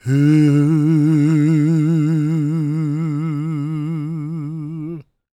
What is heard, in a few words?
GOSP MALE, SOLO; Spectrasonics; Vocal Planet